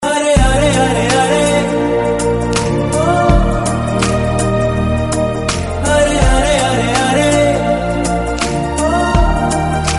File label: telugu ringtone